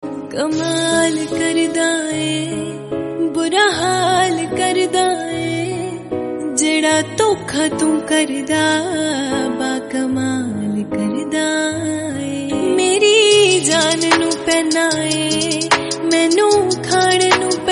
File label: Punjabi Ringtone